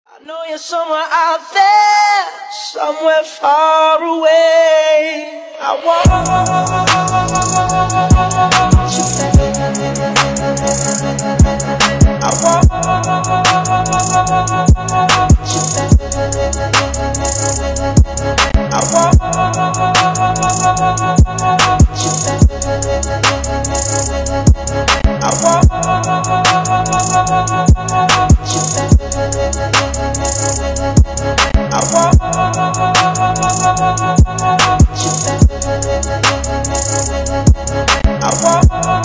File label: acoustic piano remix